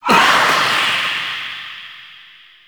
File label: Hand Stunblast Back; Warframe; Duviri